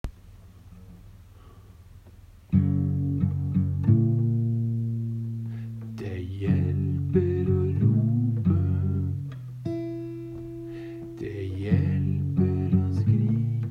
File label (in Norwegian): Skrikesangen